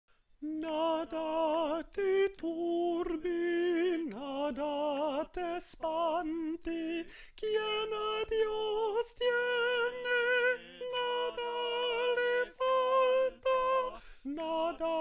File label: sopraan